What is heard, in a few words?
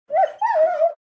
death; pets; pet siba